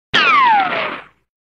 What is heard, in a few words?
Small Ricochet